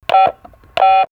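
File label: Dial, electronic fx; X-Static Goldmine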